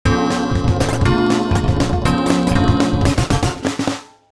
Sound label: Pop; TSMtoolbox